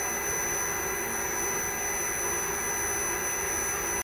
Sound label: x68000 super psu noise